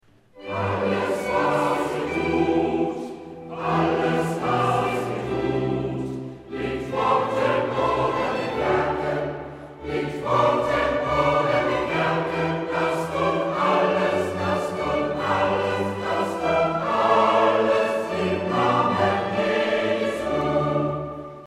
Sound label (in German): Chor, Kirchenchor